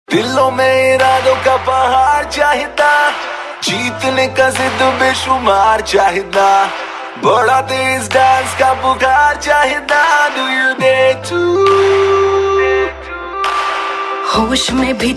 Title Song (Male); TV Serial Tone; Life OK; Dare 2 Dance

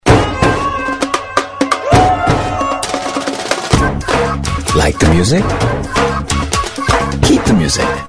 TV AD music; Samsung Joy Plus New

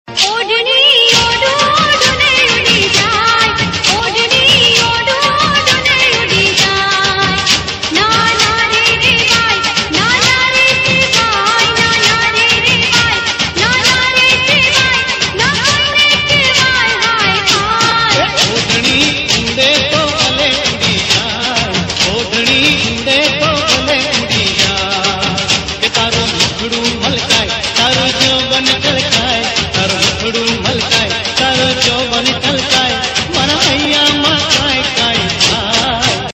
Garba Song; Saath Nibhaana Saathiya

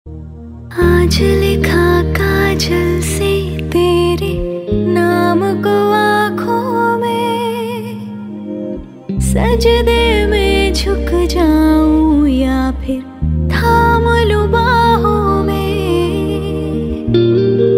Female; Chal Bhaag (2014)

